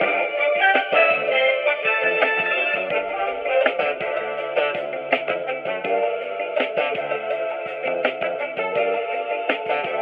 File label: horns, Phonky loops